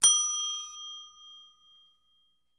High-pitched Desk Bell Rings 2x With Ring-off Close Per; Bells